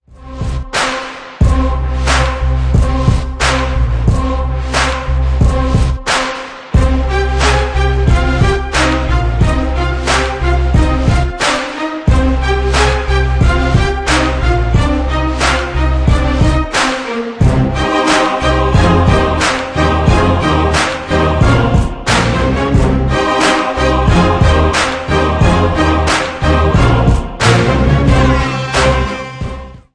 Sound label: Theme Music; Mankatha